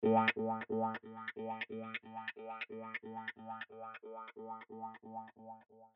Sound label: WhaWha LaserFazer